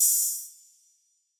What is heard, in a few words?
Cymbal